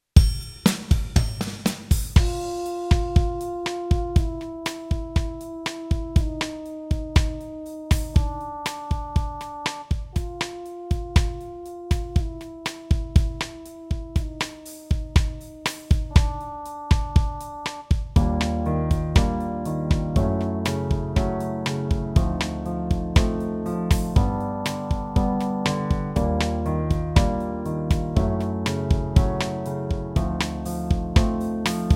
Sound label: Warmup trombone; Level B